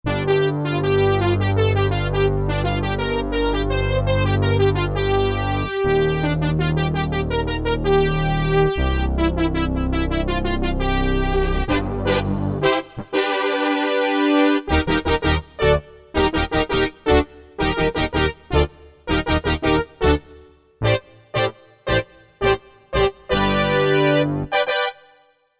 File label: Analog Brass, Korg Wavestation A-D